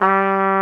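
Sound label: Big Band Fast, trumpet; Roland L-CDC-01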